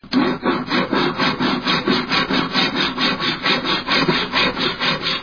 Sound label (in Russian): ceplnaya pila ruchnaya priglushennii myagkii